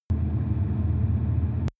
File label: drone hollow wind low